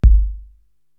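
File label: bass drum Deep, Korg TR-Rack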